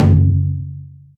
don, taiko; taiko-web

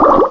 bubbles; sc88pro; tumbledemerald

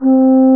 sc88pro flute; tumbledemerald

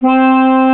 sc88pro accordion; tumbledemerald

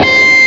sd90 special scream drive; tumbledemerald

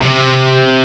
classical distortion guitar low; tumbledemerald

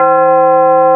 sc88pro tubular bell; tumbledemerald

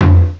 sc88pro taiko; tumbledemerald

